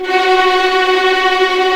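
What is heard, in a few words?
F#4 tremolo, String; East West; The Ultimate String Collection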